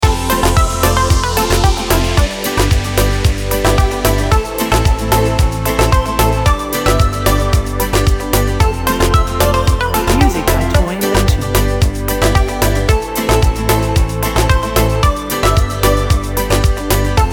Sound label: Summer Reggaeton loop